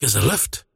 teleport pain; dota; largo

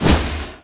projectile, damage; player; physics

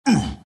wraith pain; dota; skeleton king

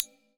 panorama misc click; ui

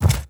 common pickup; ui; inventory

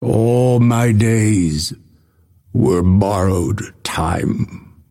death; dota; phantom assassin

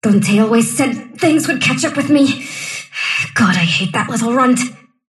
sylph death; dota; dark willow

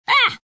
pain; dota; puck

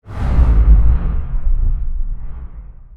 emp cast; weapons; dota; invoker

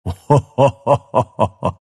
pangolin laugh; dota

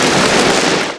uproar projectile; weapons; dota; primal beast

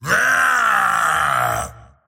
attack emote long; slyrak; dota

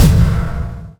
return start; weapons; dota; lone druid

